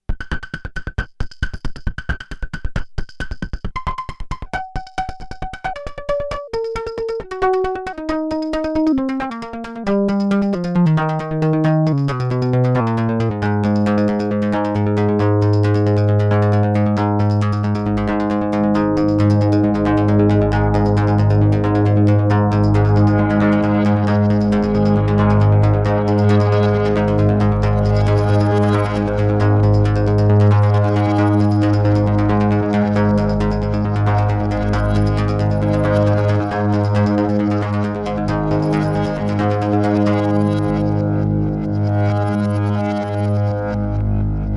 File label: Erica Synths LXR 02 Karplus Strong; Sonic Potions